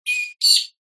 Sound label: bird peewee call australia; zapsplat